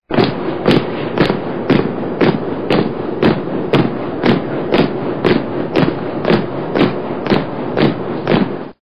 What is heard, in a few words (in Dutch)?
Omgevingsgeluid soldaten marcheren